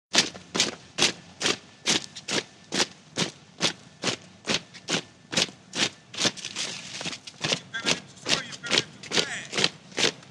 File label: Platoon Marching, drills & commands